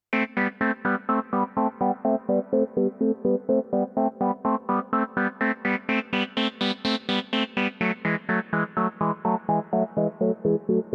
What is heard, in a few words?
Mini V4 Mini Robot Thirds; Arturia V Collection X